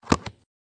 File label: SFX Placement